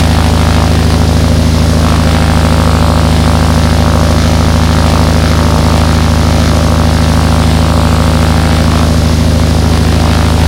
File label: morava side, engines; War Thunder